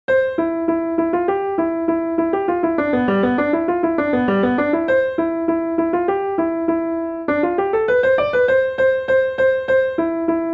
Barndance Polka, Midi

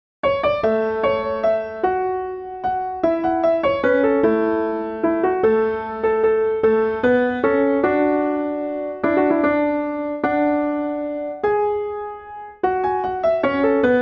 Dew Drop Waltz, Midi

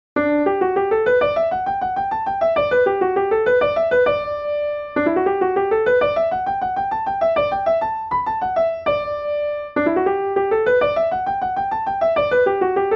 Midi; Whistling Rufus

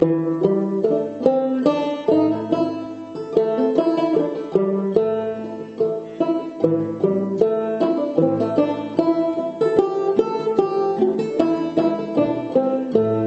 Banjo

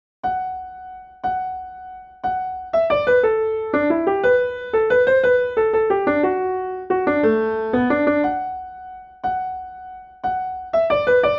Cowboy Waltz D, Midi

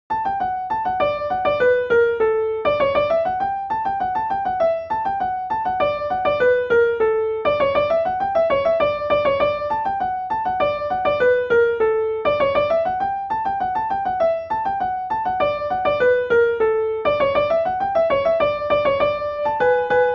Polka, Midi; Flannigans

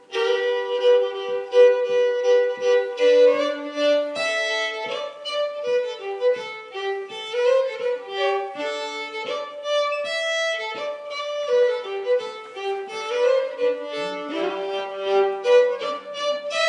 Fiddle; Old Sledge

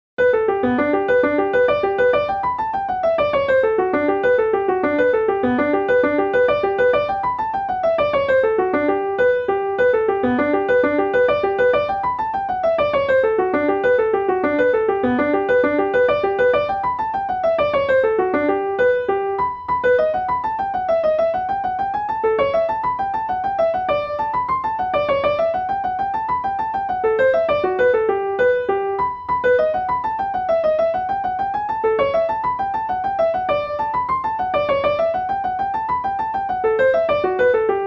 Hornpipe, Midi; Golden Eagle

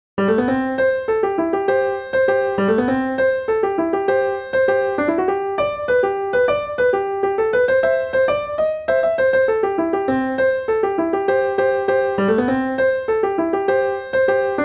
Frog On A Lily Pad, Midi

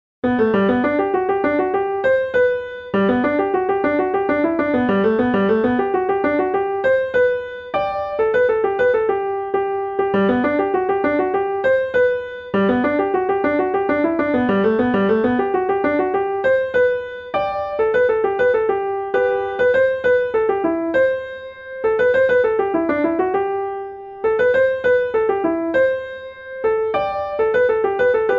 Blues, Midi

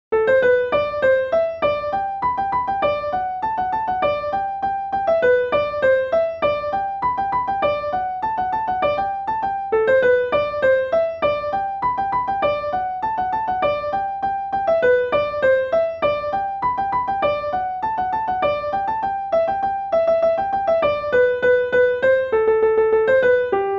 Heel And Toe Polka, Midi

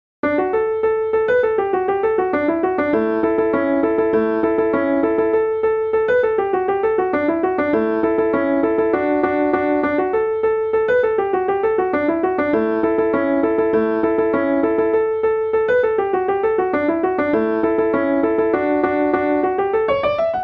Hornpipe, Midi; Marmadukes

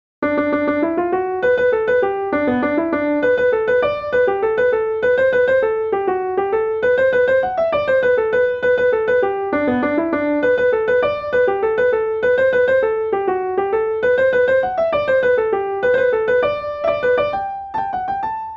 Jig, Midi; Submarine

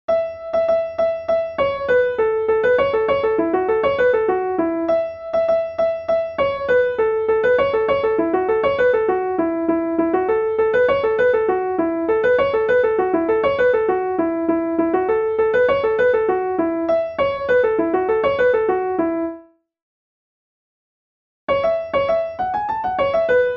Wolves A Howling, Midi

